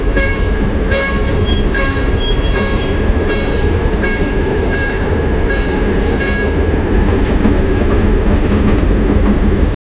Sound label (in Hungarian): tehervonat megy